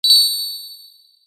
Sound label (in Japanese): チリン 鈴の音